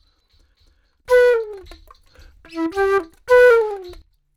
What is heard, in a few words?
110 Chill Pop Flute Em; Pop Rock